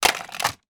toy small plastic toy vehicle drop into toy box full of other toys